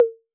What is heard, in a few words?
Conga Hi B 808; Clean; Digital